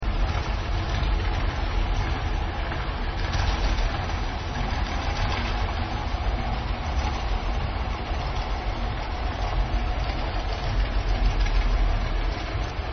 ship

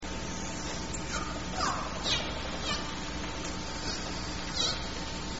wahs, transient killer whales; salish sea

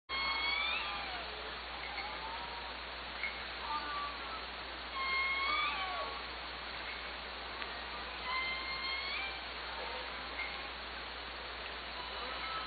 many S3s

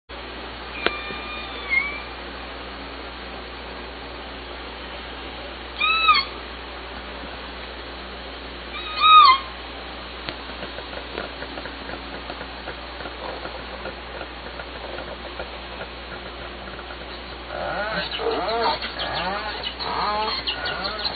calls then vessel noise, orca

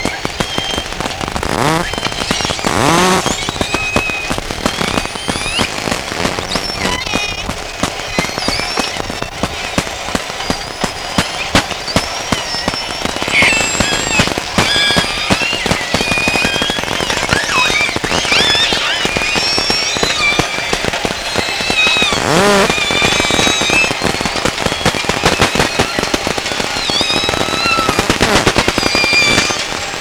forage, SRKW, Orca Calls

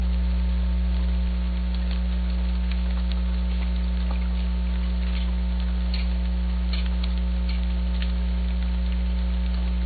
mystery hammering

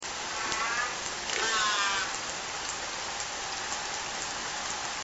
buzz S4 rise fall, orca call

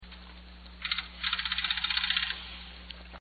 jack hammer pause

